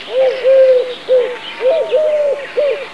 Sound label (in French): tourterelle turque Streptopelia decaocto